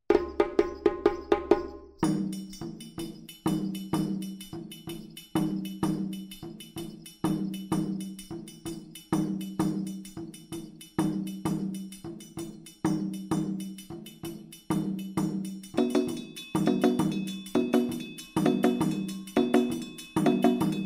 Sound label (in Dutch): Soli Rapide Opbouw, djembe